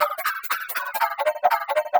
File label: 120BPM SWING; Bolder Sounds - Granular